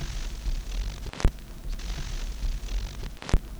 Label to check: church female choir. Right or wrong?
Wrong